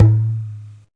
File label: bendy, African and Eastern Percussion